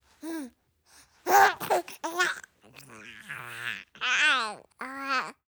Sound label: tease, baby